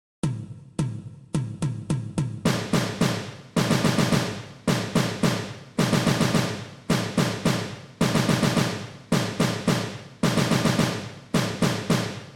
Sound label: Taps Diddles 108 bpm; percussion methods